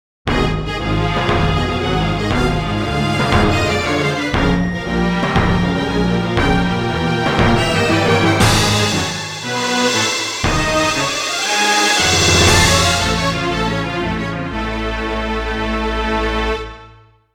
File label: Mission Failed; Star Ixiom